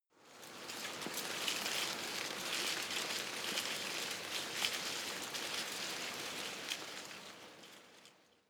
tree small leaves rustle very soft

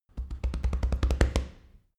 monkey chest thumping

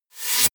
sweep

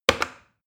plastic button start click heavy fast

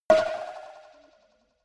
cowbell move soft

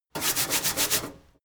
mechanic sink brush

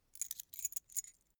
key ring small pick one

